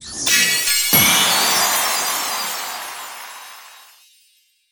Topleague Reward Ranking

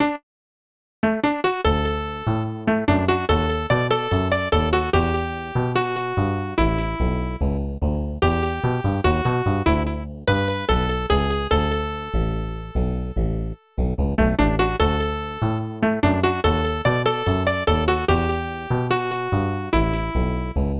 Thrash Machine; SNES

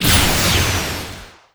Laser

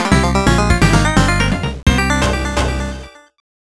Mission Success; Cyber Owls

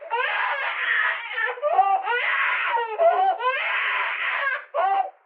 Lrad Brainwashing Signal; Lethal Company

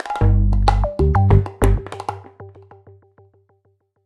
Jingle Start; Super Rub 'a' Dub; PS3

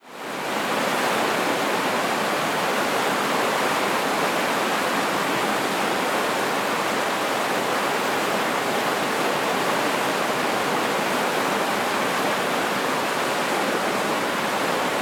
Hamburg River

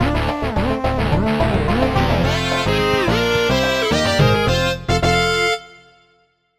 High Speed Highway Outro; Cars Supercharged; Leapster

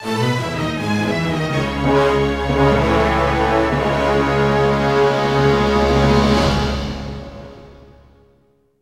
Combat Lose; Combat Lose Reg2

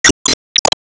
Player Pain Damage

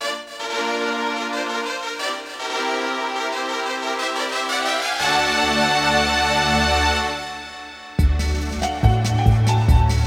Horn Intro; Shin Megami Tensei Devil Summoner Soul Hackers; 3DS; Dungeon Q (Horn Intro)

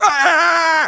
Aaa Hurt; Mothersday; Neighbours From Hell Console Edition; GC, Xbox

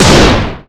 Enemy Coral Active Shot

